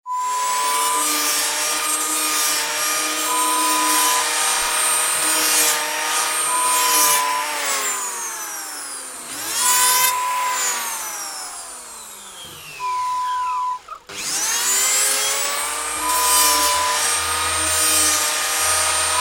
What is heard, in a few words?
Electric Saw; Horror Sound Effect; Lo-Fi